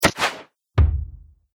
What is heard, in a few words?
Archery Longbow Hits Target; Bow And Arrow Sound Effects